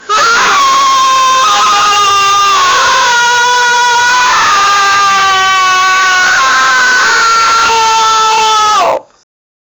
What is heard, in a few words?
human pain scream; sound effect